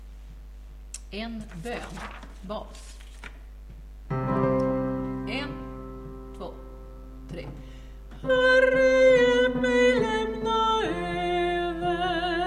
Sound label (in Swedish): bas